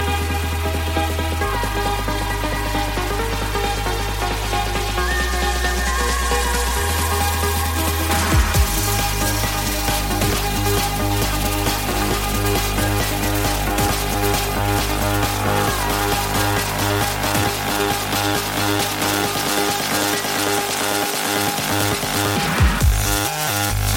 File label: TRANCE